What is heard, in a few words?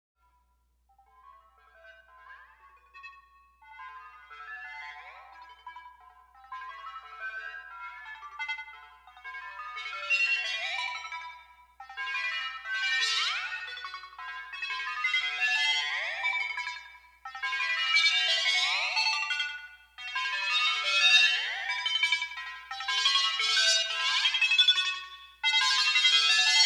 Synth Volca keys Long mangled 88 G maj